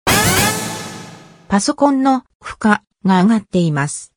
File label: JINGLE